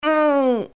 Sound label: noodle groan